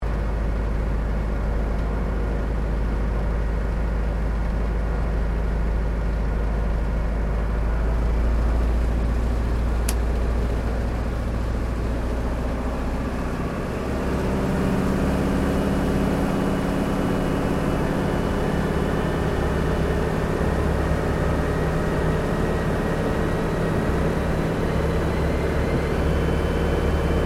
Aircraft 1956 CAC CA-25 Winjeel Take Off Interior, WW2 era Australian training aircraft; Airplanes